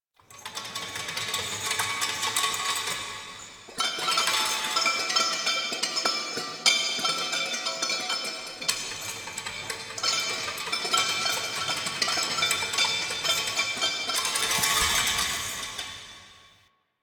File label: Dungeon Island Chains, Ambience; Warframe; Duviri